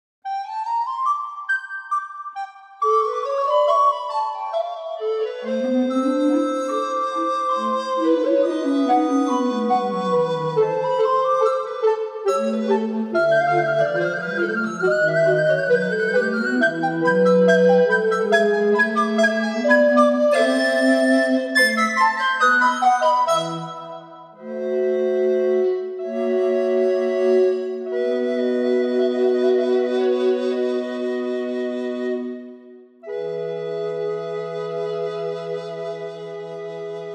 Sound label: Recorders